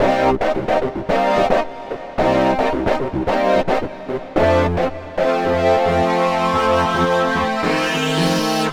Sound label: Funk Seq; Dr. Fink's Funk Factory